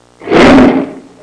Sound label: Vintage lion roar recording